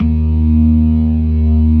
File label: ORGAN, NO PERC